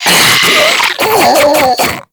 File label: Infested Diseased Ancient Call; Warframe